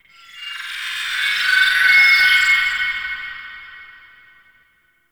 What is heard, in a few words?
High Tech; Guild; Warframe; Misc Remaster